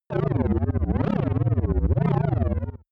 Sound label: electric flutter